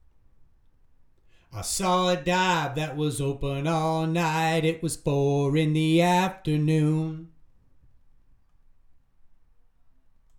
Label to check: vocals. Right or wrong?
Right